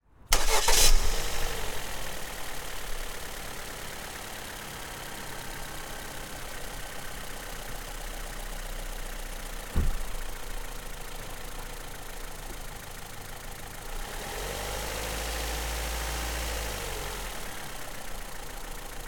Car Ford Fusion Petrol 1.6 Duratec Turn Key And Start Engine Rev In Neutral Stereo; Rode NT5 ORTF MCU; Submerged Tapes; Ford Fusion Plus Ambisonics